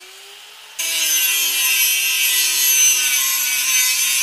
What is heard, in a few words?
angle grinder